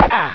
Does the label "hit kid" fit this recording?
Yes